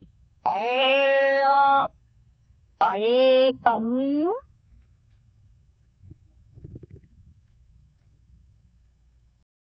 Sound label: sound of sad monkey